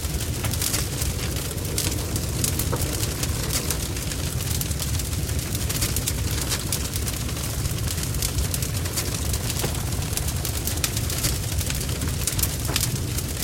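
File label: Fire; Pozhar; One Eleven Android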